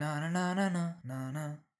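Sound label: melody C major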